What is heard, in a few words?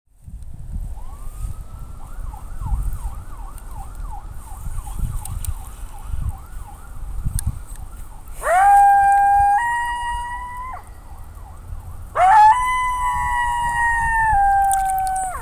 coyotes; wildlife